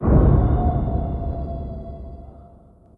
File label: reveal